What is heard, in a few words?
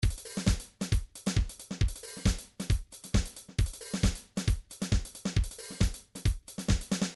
trance midi loops beats